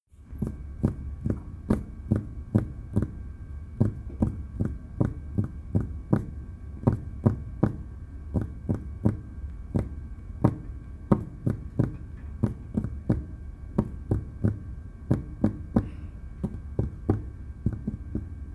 Tapping

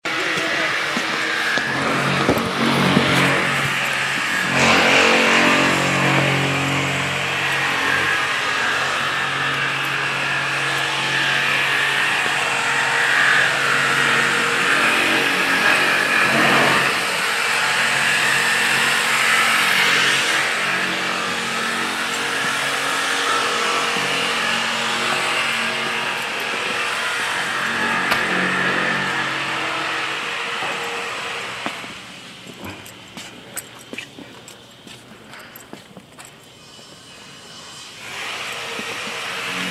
Saws

